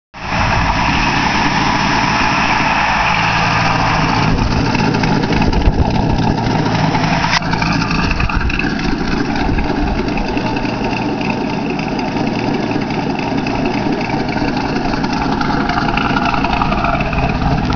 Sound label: Idle, Chevy Camaro Z28